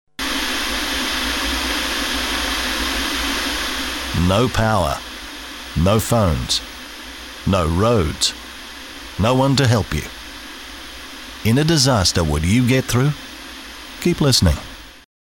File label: Static